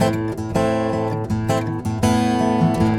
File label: A GUIT A MIN, 80BPM; Best Service; World Colours